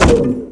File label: drop